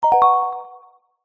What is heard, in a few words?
multimedia notification mallet synth dreamy